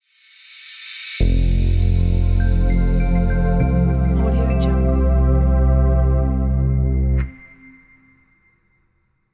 intro logo

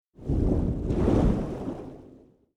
fish movement midrange fuzzy burst bubbles; prosoundfx

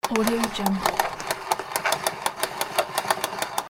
rolling cable from spring roller; fxprosound

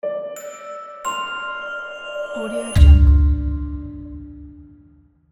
logo

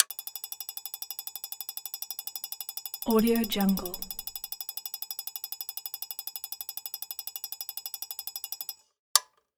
strobe light on

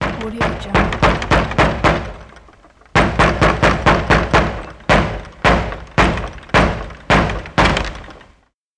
knocking on a door

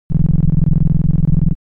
game fx low rumble; smartsoundfx

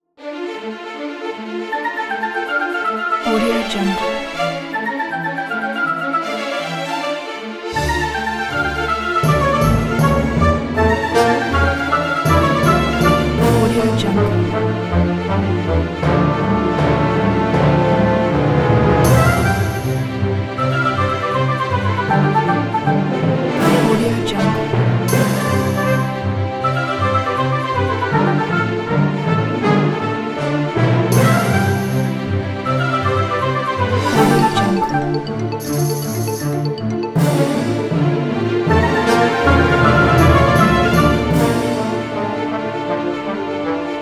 orchestral action chase cartoon

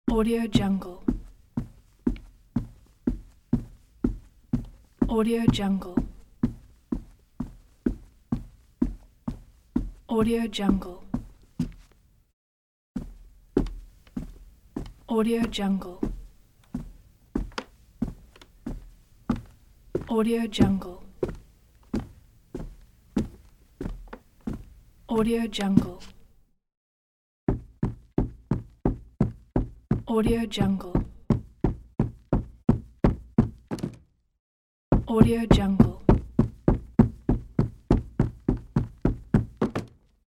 footsteps sneakers on carpet running and walking

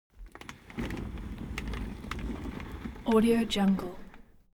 object chair office worn trundle long; smartsoundfx

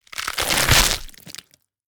gore rip flesh; soundmorph

